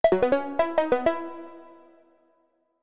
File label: win